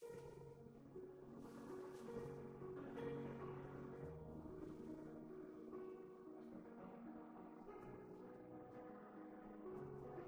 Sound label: Impromptu Noise; Schubert